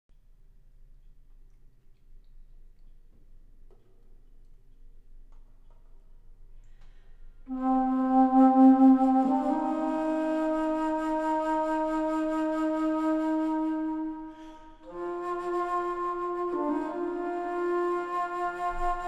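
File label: melody; Myst III Exile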